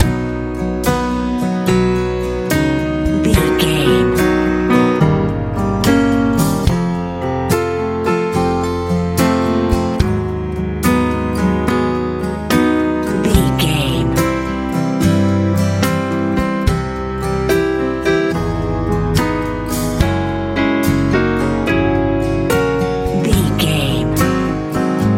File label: colorful warm echo head dreams country ballad